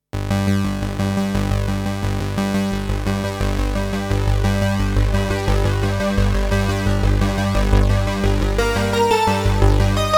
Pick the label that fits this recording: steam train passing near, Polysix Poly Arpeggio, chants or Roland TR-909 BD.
Polysix Poly Arpeggio